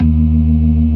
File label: CLASSIC C3, Synth and Organ